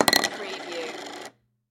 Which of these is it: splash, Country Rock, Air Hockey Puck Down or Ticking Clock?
Air Hockey Puck Down